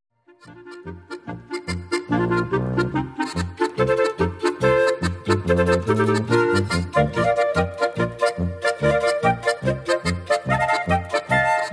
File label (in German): Polka